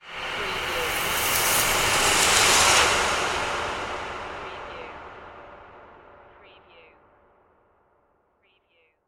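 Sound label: Production Element Whoosh Reverb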